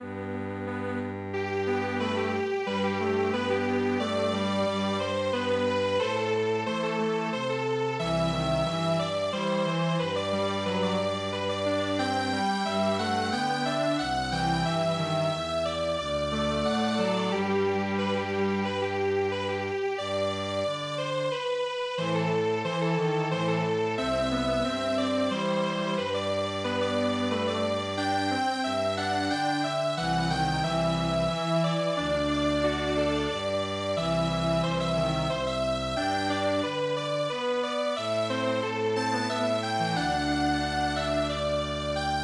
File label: Strings Quartet, traditional; midi